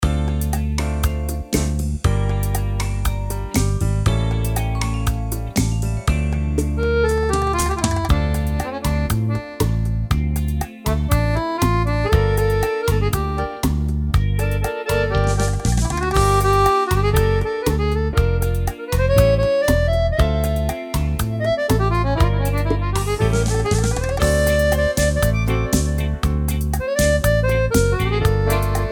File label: Symphony Bolero